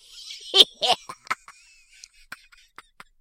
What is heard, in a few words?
laugh; Devour